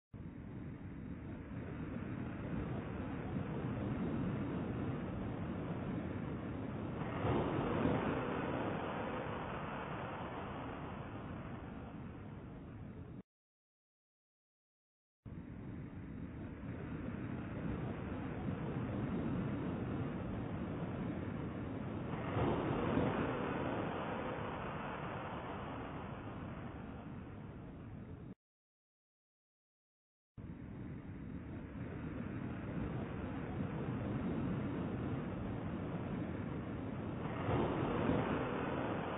slowed

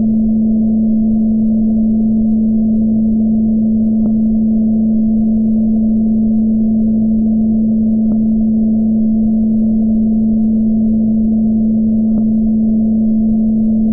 tone

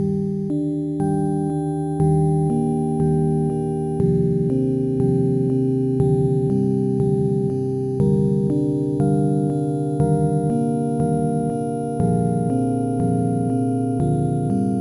Undersea music box slow

